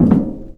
metal box footstep; hl2

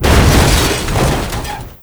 car trap explode impact; hl2

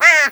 pain, crow; hl2

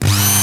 saw start; weapons; Sourceforts2.0

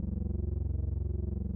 Idle hum, physcannon; weapons

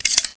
target pistol reload; weapons

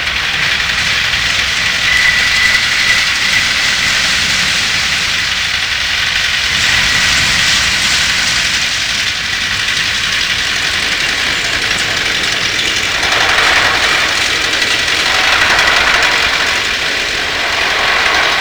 many pods loop, citadel; hl2

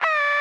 radio random, prison; hl2

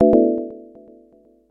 warn; sourceforts1941; xenon

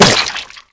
squashed, antlion grub; hl2

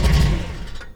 v8 stop, vehicles; hl2

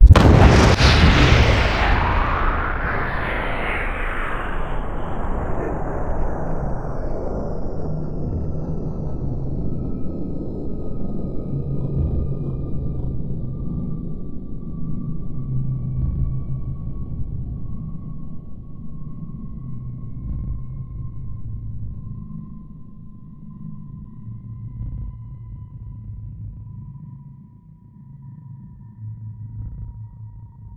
end explosion, ambient explosions; citadel; hl2

